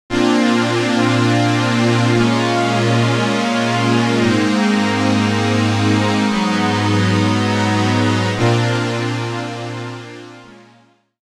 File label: roland rs202 filter; gforce vsm; uad filter